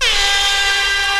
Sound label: horn